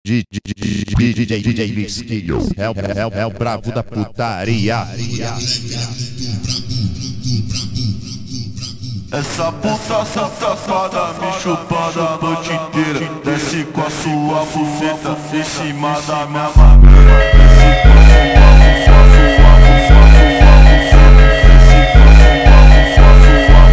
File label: slowed, funk